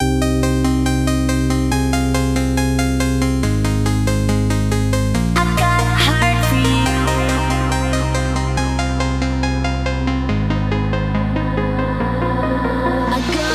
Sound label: dubstep